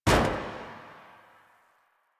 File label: Parking Lot Car Door